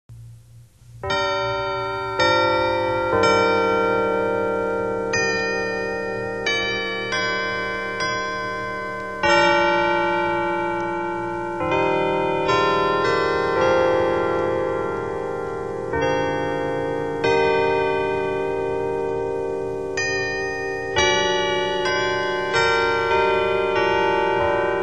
carillon chimes

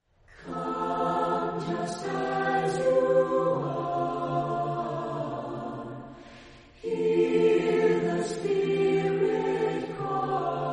Chorale, Voices in Praise acappella

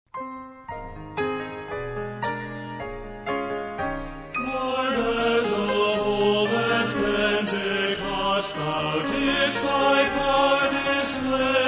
Men's Quartet

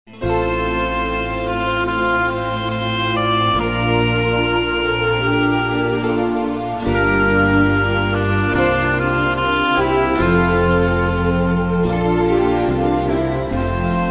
Instrumental Praise

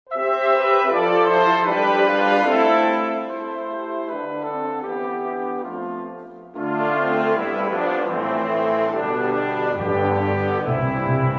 Brass Choir, Passion Chorale

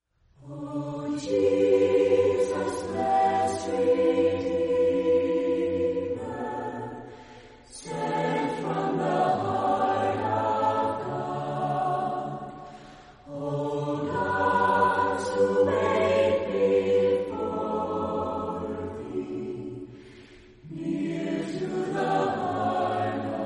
Chorale, Voices in Praise acappella

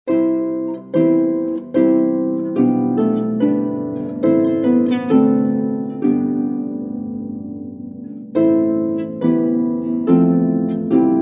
Harp Hymns

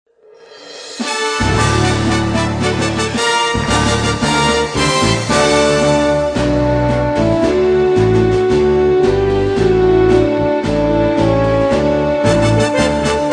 Instrumental Hymns

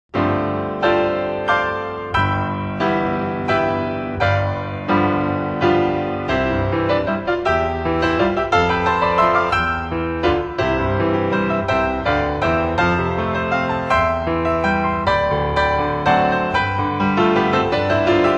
Keyboard

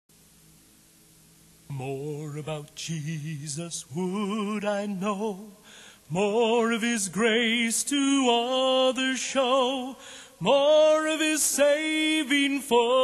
Old Hymns A Capella

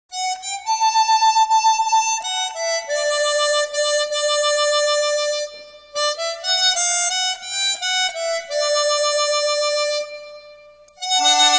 Chromatic Harmonica; Turn Your Eyes Upon Jesus

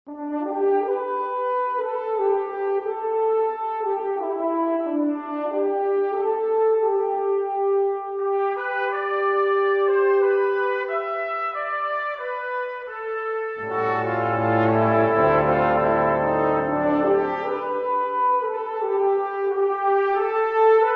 Brass Choir

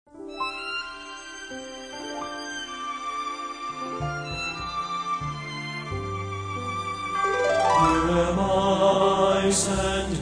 Trio, Here Am I

